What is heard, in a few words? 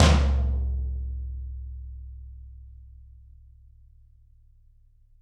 Toms, Drums; Bob Clearmountain Drums I